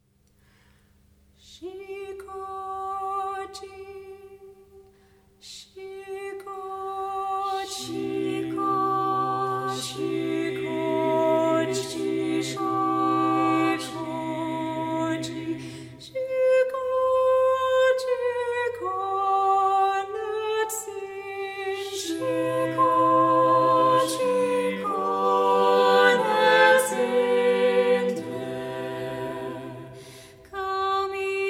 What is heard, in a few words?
Choir; Xicochi; Brisbane Concert Choir